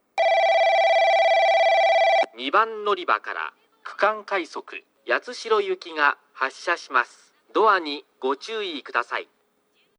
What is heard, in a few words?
tosu regional rapid yatsushiro; 6番のりば発車放送（区間快速 八代行き）; 鳥栖